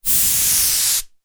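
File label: Air swish long left to right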